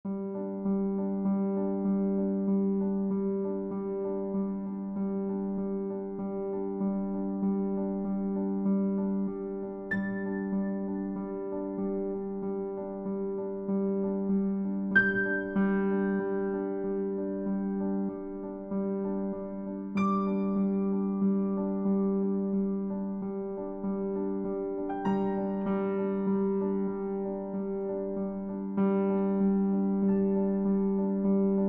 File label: piano theme